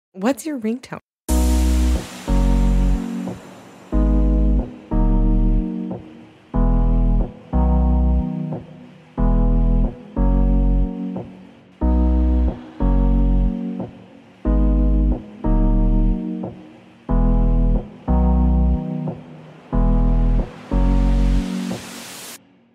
ringtone instrumental cover ringtone iphone android